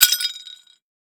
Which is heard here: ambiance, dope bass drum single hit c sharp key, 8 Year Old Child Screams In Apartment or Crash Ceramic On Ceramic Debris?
Crash Ceramic On Ceramic Debris